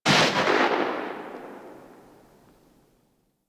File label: Spark Rifle Shot End; Grineer